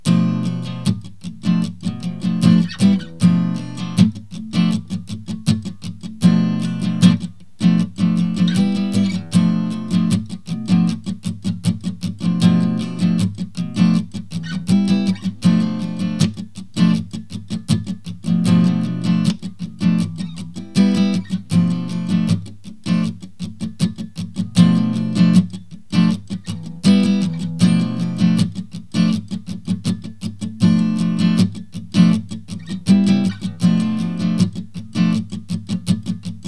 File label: Bm7b5 drone, harmonica